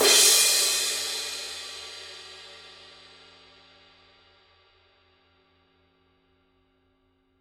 17 dark energy crash mark i edge; paiste 17 dark energy mk I crash cymbal; Signature

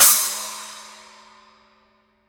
10 dark energy splash mark i edge; Paiste 10" New Signature Dark Energy MK I Splash Cymbal; Washy, Crisp; Intensity: Lively; Sustain: Short; Bell Character: Integrated

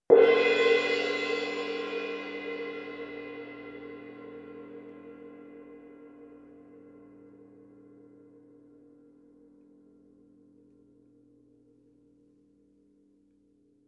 Sabian 18" AA Molto Symphonic Suspended Cymbal

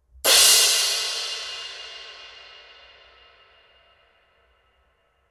K EFX 18, cymbal; K ZILDJIAN

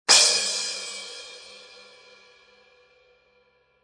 fast crash; AAX X-Plosion; cymbal; Sabian

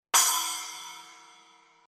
Paragon splash med, splash cymbal; Signature Neil Peart; Sabian

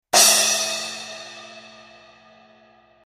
splash cymbal; 12; HHX; Sabian

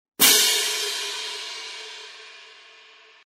Sabian HHX Synergy Medium cymbals

